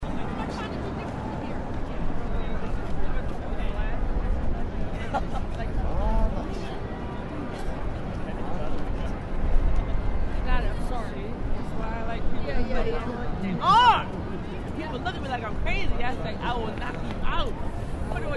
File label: Time square pompiers